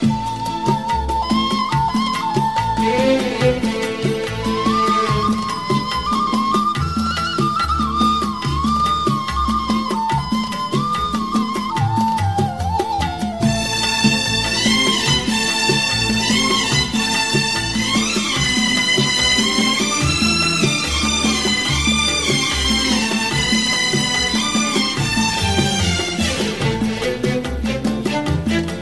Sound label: Classical